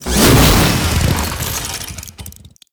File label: Infested Hammer Whoosh, Weapons